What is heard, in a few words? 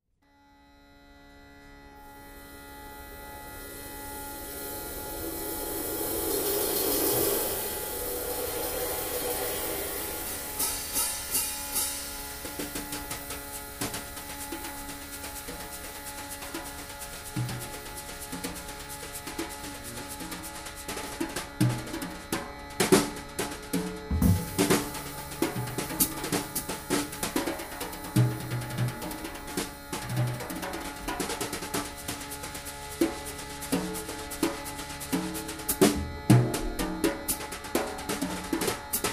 celtic jazz